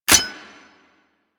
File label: Heavy Shotgun Mech Clip; Weapons; Warframe; Tenno